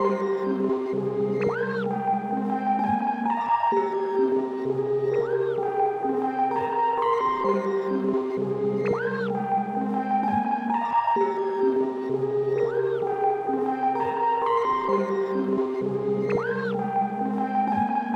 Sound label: 129 BPM C Min Glitch Pad; Melody; Trap; Loop Stems; Cymatics; 2022 Melody Collection - Trap Pt 2; Richer Than Ever